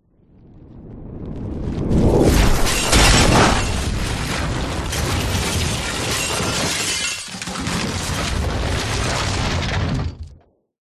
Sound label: impacts massive junk debris impact with whooshes; zapsplat